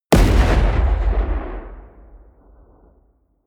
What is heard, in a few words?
missile medium explode close